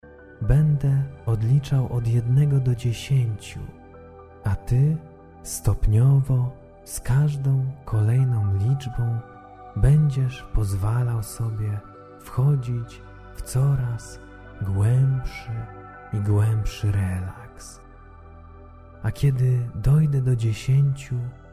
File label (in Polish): Atmosfera spokoju